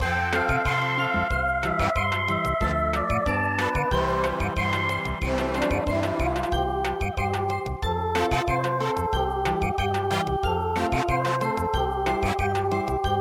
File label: Samba